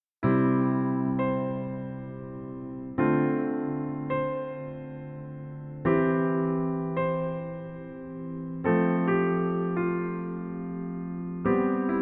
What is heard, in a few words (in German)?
Pianomania